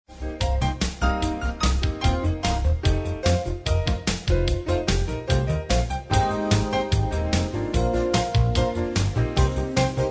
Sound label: jazz pop